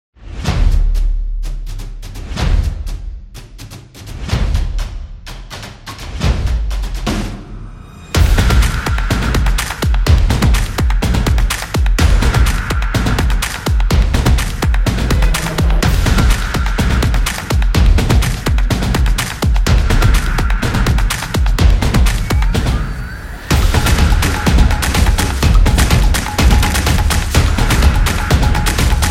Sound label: Sport Music; soccer stadium